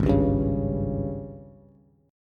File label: Ab Major 7th Suspended 4th Ab down Bass; strummed; JGuitar